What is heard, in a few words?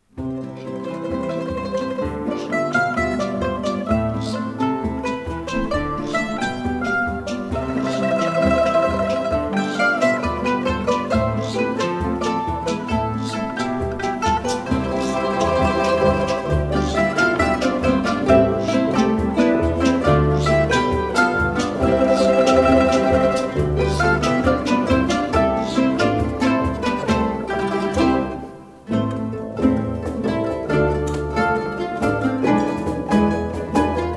rumba